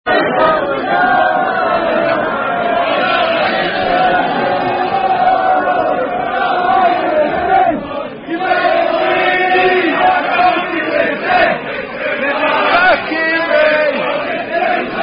Unknown Football Chant

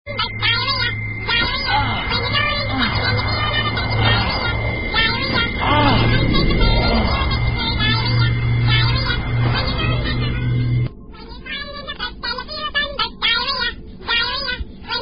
Diarrhea Song

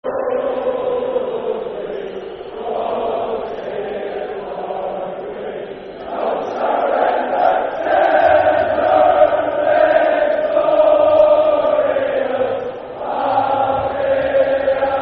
Football Chant (Soccer Song) N. Ireland; God Save The Queen; N.i.f.a

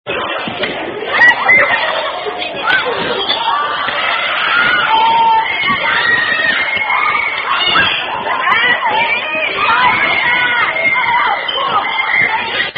Hand Clapping Game; Ring Ching China

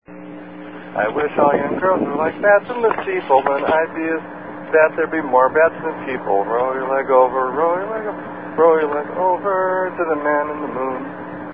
Roll Your Leg Over [One Verse And Chorus]

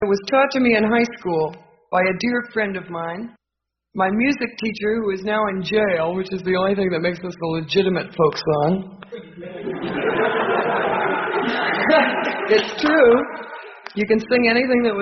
Salvation Army Song; Clean Version Of Throw A Nickle On The Drum

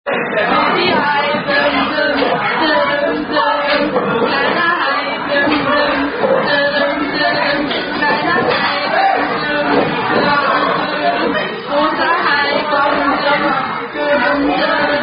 German Attack Song; German Baby Shark

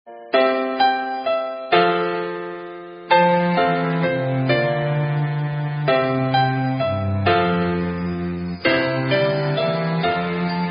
Karaoke Songs; Girl Scouts

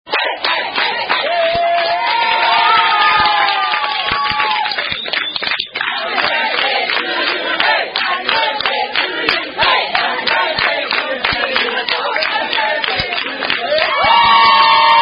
Happy Birthday (Standard) Sung By Wait Staff; Chili's Wait Staff

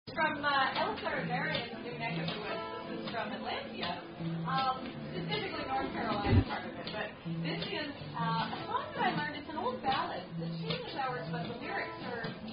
Song; Farmers Cursed Wife; SCA Song

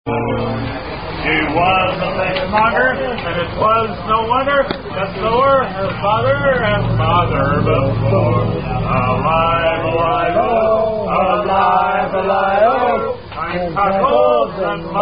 Molly Malone, Sung While Drinking; Clean Sung While Drinking

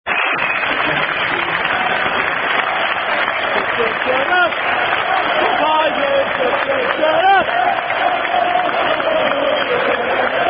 Footy Chants

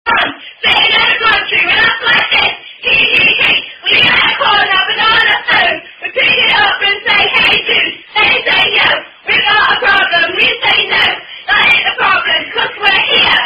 Rhyme Song Chant; Two Dumb Blondes; Unknown Rhyme Song Chant

